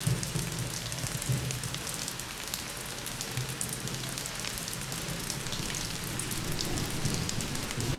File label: muffled rain inside a car